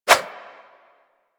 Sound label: Spheres Throw Whoosh; DE; Warframe; Weapons; Solstice Spheres